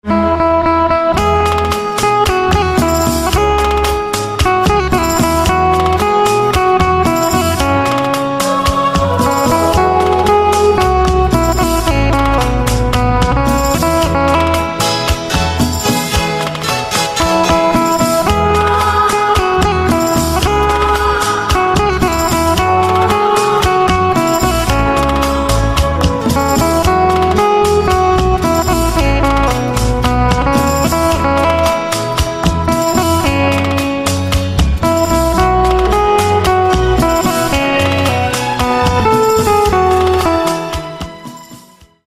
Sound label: Banjo